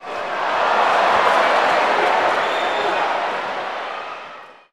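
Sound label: Basketball Crowd Reaction; SFX Kit; Crowds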